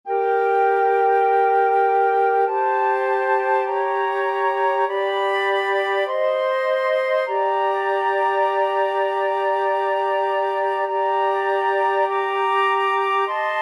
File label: aequale, flute trio